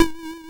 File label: Vibra E1; multi-samples; Vibraphone; CHIPSHOP DELUXE